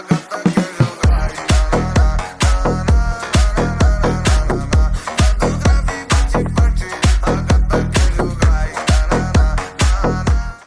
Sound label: mega funk